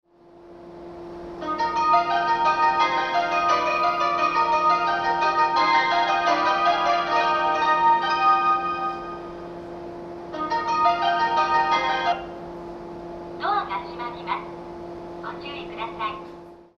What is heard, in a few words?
melody